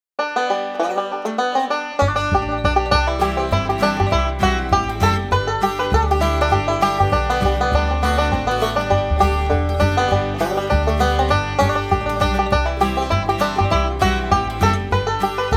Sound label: Dixie on Banjo